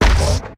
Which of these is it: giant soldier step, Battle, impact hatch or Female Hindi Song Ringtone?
giant soldier step